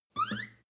string chirp slide up double

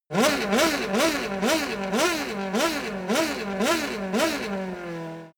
racing car f1 rev medium close

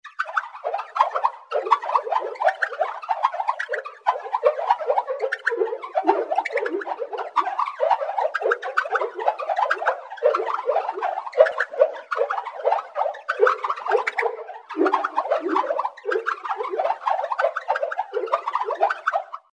creature loop

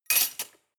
cutlery large drawer place hard

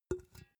empty can put down soft

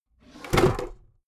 desk drawer open heavy

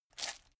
chain mail armor movement

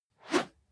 whoosh whistle fast

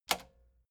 metal button spring click slow

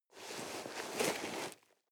nylon leather soft

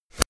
card flap

